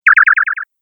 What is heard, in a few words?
Space Future Communication Sound, sci fi